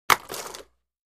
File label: Roller Blade Drop, roller blading